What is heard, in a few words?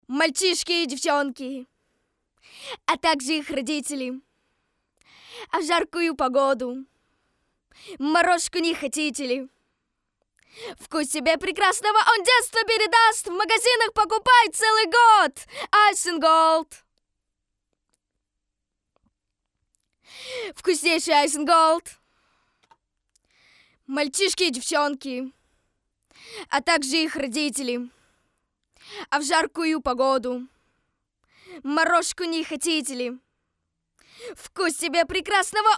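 vocal; Ice and Gold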